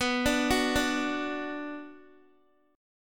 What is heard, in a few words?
B Minor B Guitar; arpeggiated